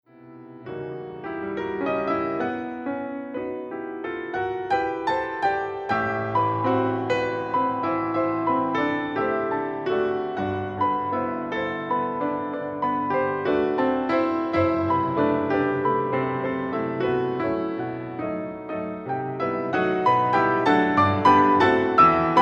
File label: Piano Sonata No 2 Op 36 II Non allegro; Rachmaninoff: Sonata No. 2